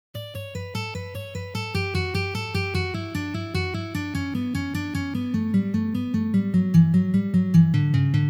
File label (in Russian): Uprazhnenie dlya igry gammy Si minor